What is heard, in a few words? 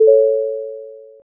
chime